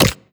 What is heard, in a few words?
bite, headcrab; reviver; creatures